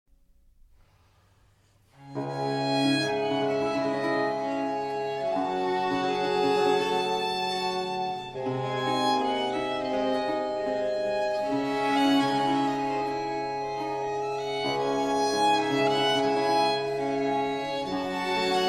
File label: Concerto in G minor Larghetto; concerto venice the golden age